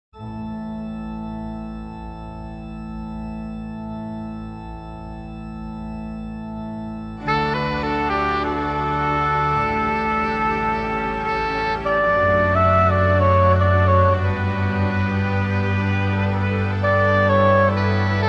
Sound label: organ and sax